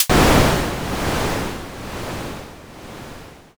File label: thunder